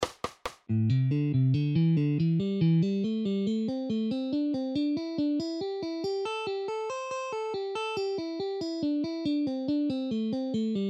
alternate picking at 40 bpm

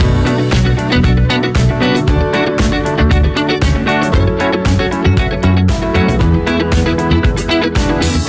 loop

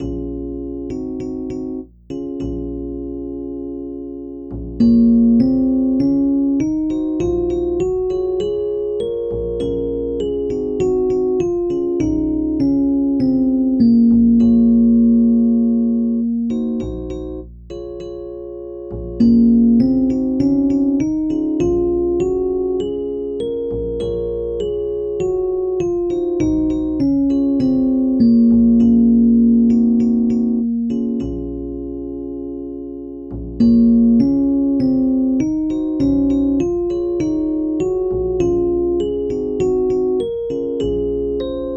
aeolian

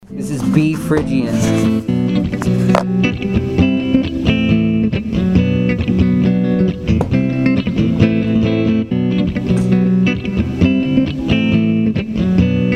modes in key G B phrygian

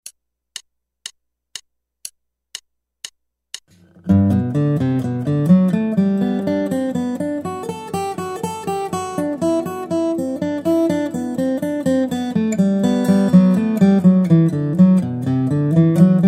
Guitar

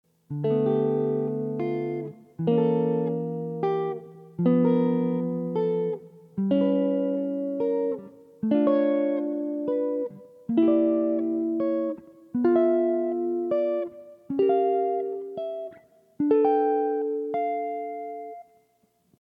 Min 6th and Dim Chords; borrowing on 1st; jazz chords; Barry Harris harmonization

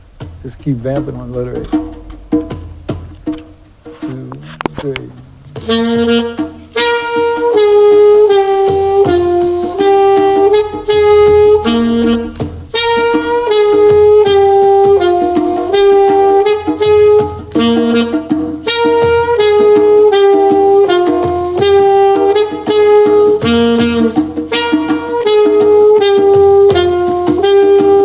8th note syncopation; jazz; rhythmic nature of jazz; Mike Longo

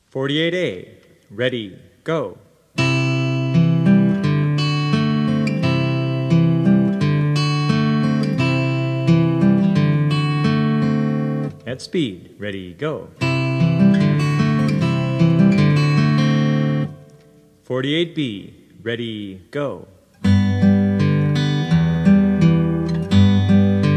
travis picking

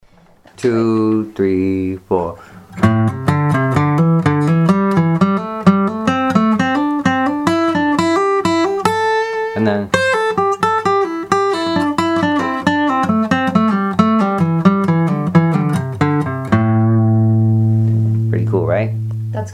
hammer on and pull off exercise

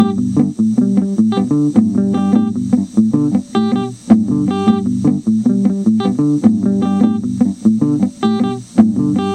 guiro loop

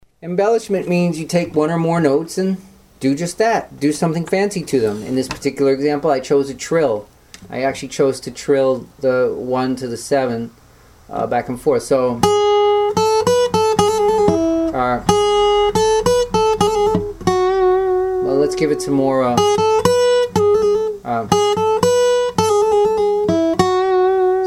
varying a melody

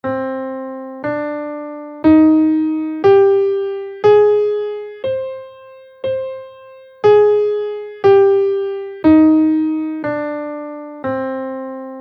hirajoshi scale in Cm

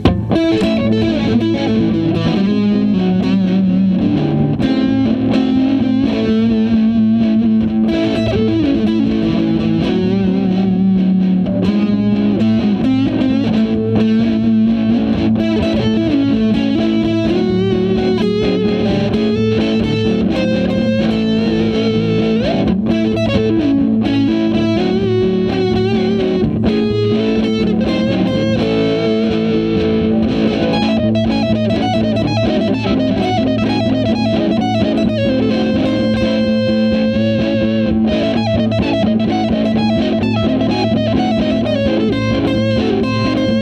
lydian 2 mode VI harm min

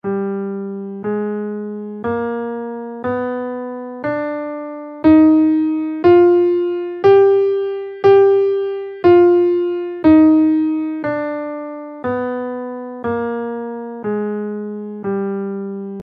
phrygian b4 scale in Gm, guitar

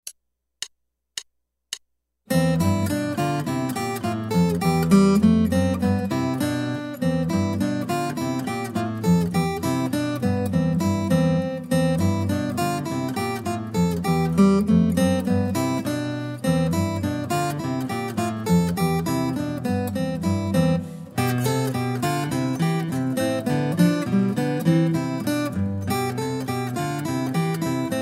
Guitar

